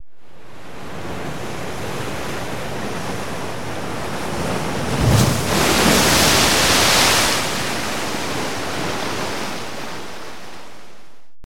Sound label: Wave Crash Mono, waves surf